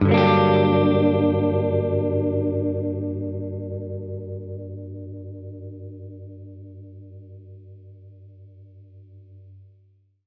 Gretsch Chord Gmin[maj7], Guitar Chords; undercover samples

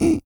Vocal, Blues & Soul; CUICA MAN

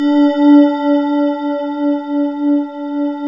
FM BELLS; FMSTORY#2

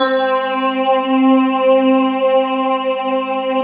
VECTOR E.P

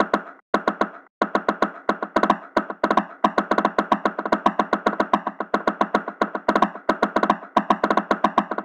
Subtractive Beat; Sonic Foundry (Sony Creative Software)

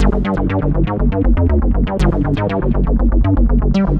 G1, Synth Line; 90 s Sample CDs; NiceBeats; SynthLine VOL-18

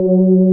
VO G2, ANA VOX, SYNTHESIZER; AKAI S6000 CD-ROM - Volume 1

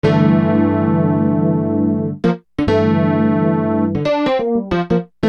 Keyboard, Soul

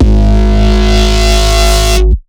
FM Tone; neuro; BIG5AUDIO

